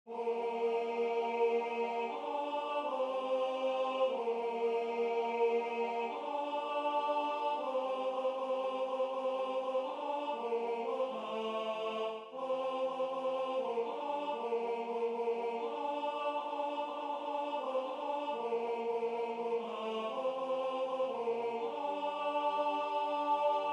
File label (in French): tenor